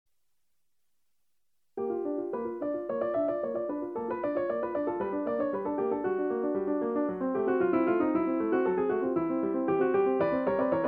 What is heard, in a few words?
piano solo music, modern